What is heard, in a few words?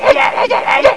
Explosive Get; Crystal Crazy Demo; Explosive Gun; Casady & Greene